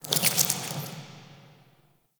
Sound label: Under City Insect Wet Scurry